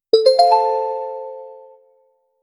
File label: chime